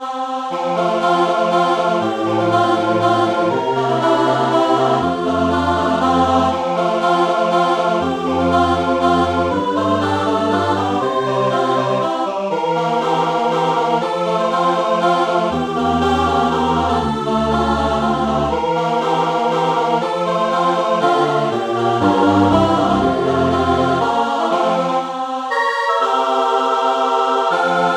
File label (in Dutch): Troostliedeke